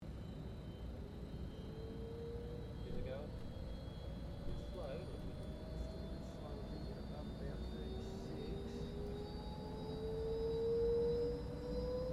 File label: HELICOPTER BELL UH1 IROQUIOS STARTUP INTERIOR; RAN UH-1 Iroguios; Helicopters (Sound Effects:Vehicles)